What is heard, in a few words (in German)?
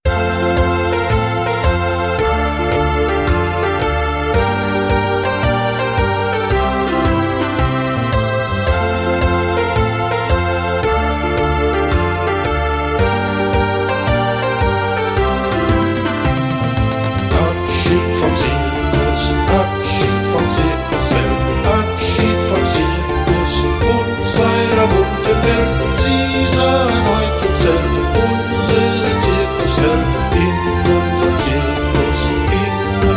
zirkuslieder